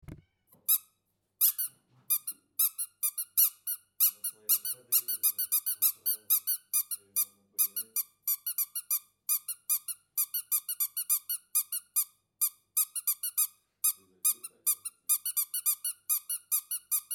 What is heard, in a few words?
Duck Toy Sounds